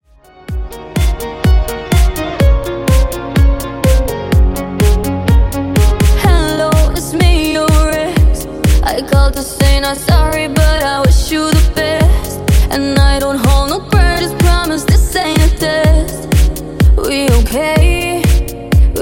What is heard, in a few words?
club, 125 bpm; Club Extended